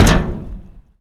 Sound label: bump; Ballistics; Speeders